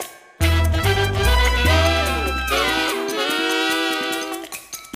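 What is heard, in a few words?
food world music paradise suspens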